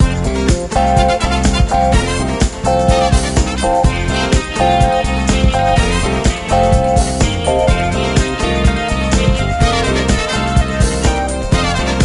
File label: Uptempo Music